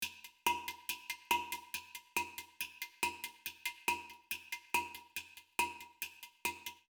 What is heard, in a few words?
16tel hang